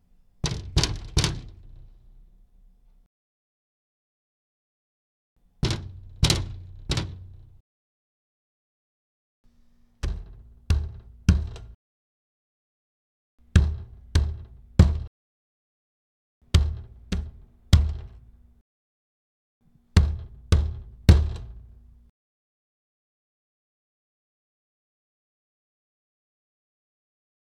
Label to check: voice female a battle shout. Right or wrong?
Wrong